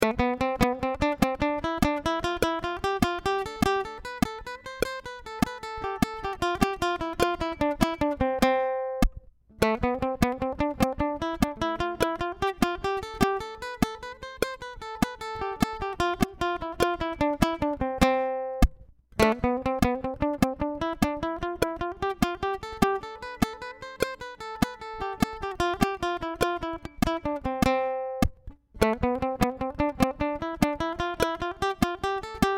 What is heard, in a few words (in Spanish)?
C Melodia Ejercicio Solo Click